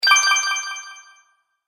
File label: Magic Purchase Delay Ding